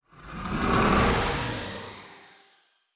relic activation spinning top; astral planes

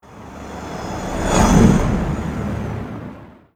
event ship thrusters; Stellaris

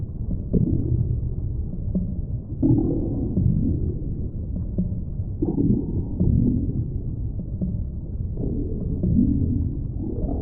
tiyanki reanimated attack; Stellaris

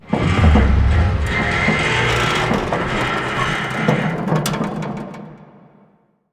archeology event metal fatigue; ancient relics

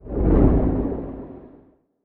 kaiju lightning hit whoosh; Stellaris; biogenesis; weapons

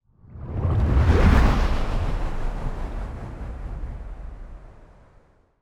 colony ship move; aquatics

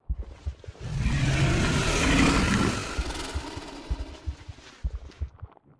space dragon idle; Stellaris; guardians

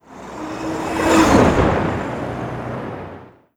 event ship thrusters; Stellaris

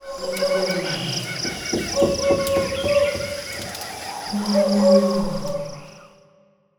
wilderness greeting; biogenesis

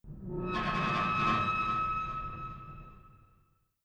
abandoned ship stinger, ambient; Stellaris

